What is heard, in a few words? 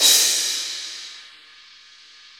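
cymbal crash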